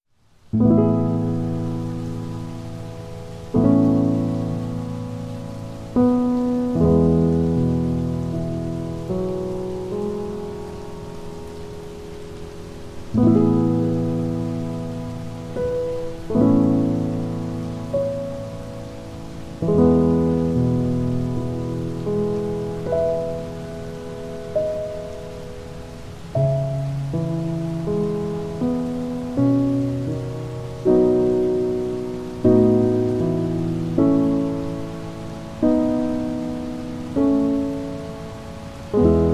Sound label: Piano, Piano & Rain